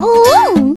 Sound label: uwu; chatsounds